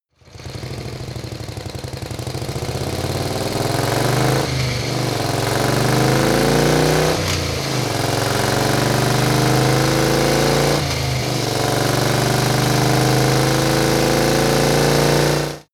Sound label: motorcycle harley engine accelerate gear sequence fast